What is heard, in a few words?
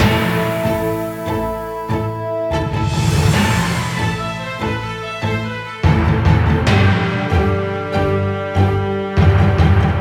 TENSION LOOPABLE